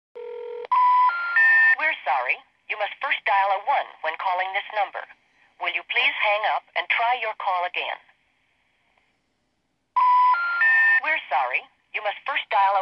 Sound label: dial 1, Verizon